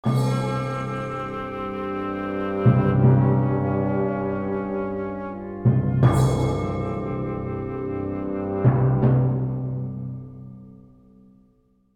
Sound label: Jingle Negative